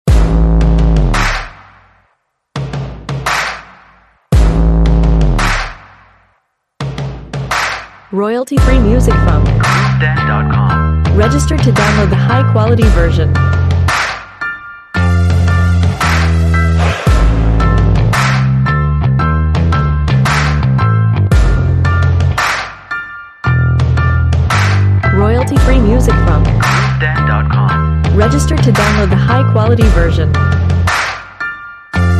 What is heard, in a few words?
Synth Pop Rock, Steady and deliberate beat and distinctive clap combined with bright piano and deep bass